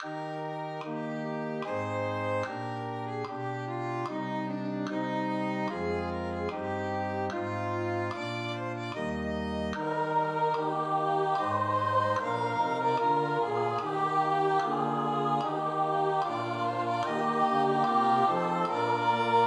Ihr Engel Sopran; Kantorei

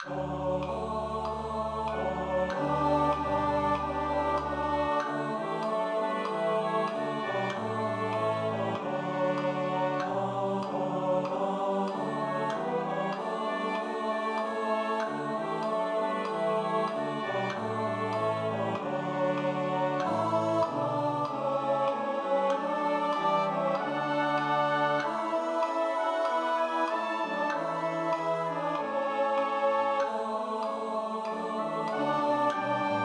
Jesus Christus ist erstanden Tenor; Kantorei

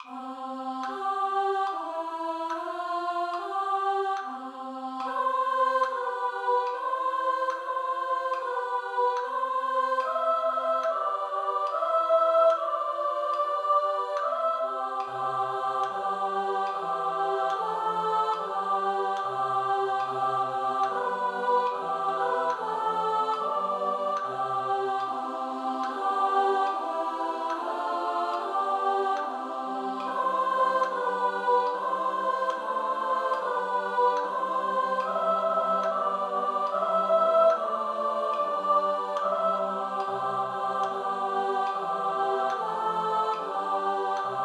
Ehr sei dem Vater Sopran1; J.M. Haydn; Kantorei

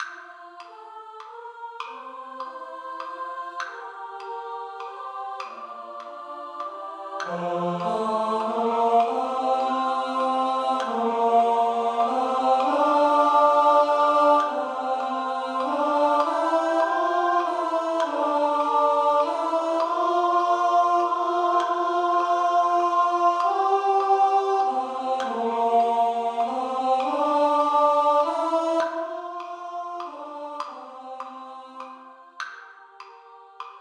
Lasset uns unser Herz Tenor; Kantorei

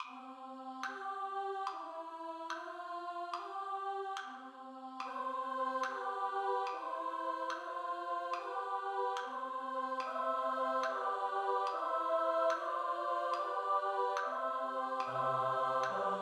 Ehr sei dem Vater Bass; J.M. Haydn; Kantorei

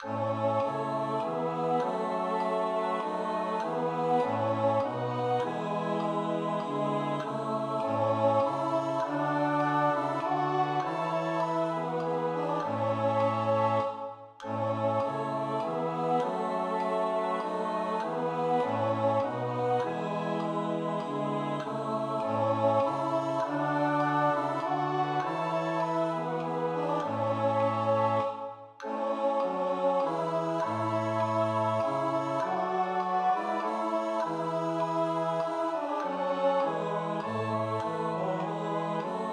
Der Geist des Herrn Tenor; Kantorei

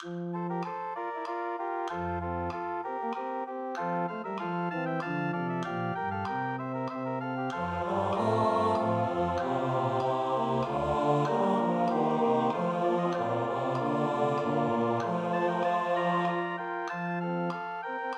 Jesus richte mein Beginnen Bass; Kantorei

